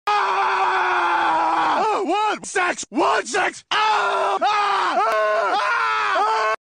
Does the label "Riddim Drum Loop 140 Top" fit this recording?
No